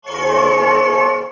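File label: Templar Idle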